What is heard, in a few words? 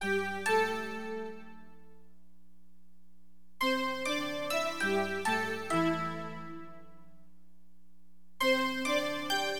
BELLS, Keyboards; LiveAct Pool; AcidJazz Funk